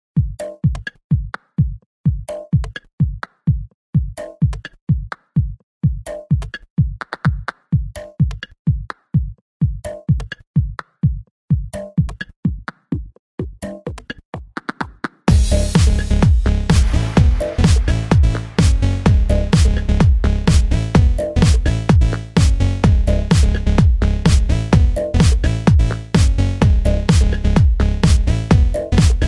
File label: Latino Dance